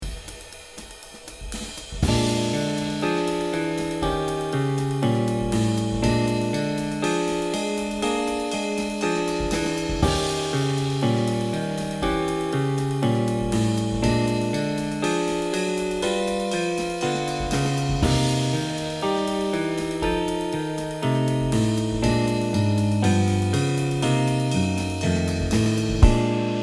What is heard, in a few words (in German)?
Walking Bass Blues Bb